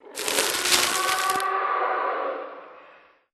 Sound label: spooky treat bag pickup